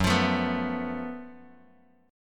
F sharp Minor Major 11th, Bass Standard, chord; strummed; JGuitar

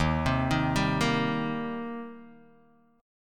Eb 6th Eb down Guitar DADGAD

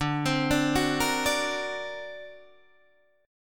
D Augmented 7th D down Guitar Open D

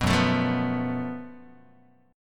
F sharp Minor Major 7th Double Flat 5th, Guitar; strummed; 2,2,0,3,3,x; down; 4A,4E,5A,5D,5Gsharp,6Csharp

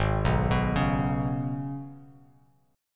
Ab Minor Double Flat 5th down Bass Standard; Abmbb5; arpeggiated

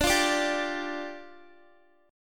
D Minor Double Flat 5th, down, Guitar; Dmbb5; strummed

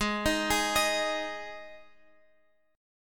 G sharp 5th, guitar; G#5 Chord {X 11 13 13 X 11} arpeggiated

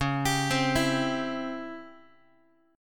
Db Minor Major 7th Flat 5th Db down Guitar; 4A,4E,5A,5D,5G#,6C#; arpeggiated

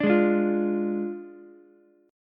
F Minor 6th, Ukulele; JGuitar

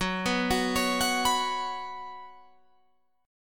Gb Suspended 4th Gb, down, Guitar, Open F; Gbsus4 arpeggiated

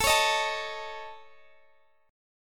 A Major Flat 5th, Guitar Standard; strummed

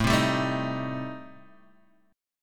A-Minor 7th Flat 5th, Guitar Standard, chord; Am7b5; strummed; capo=2

